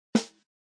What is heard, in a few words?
snare mic, drums